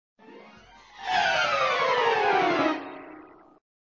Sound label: Music Fall down music, flipper